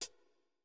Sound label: hall resonance hihat